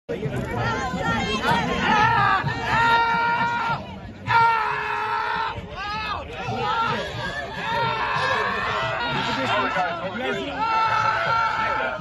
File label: screaming protester